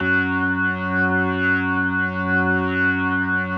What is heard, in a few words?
F#2S, B3, Vintage Keyboards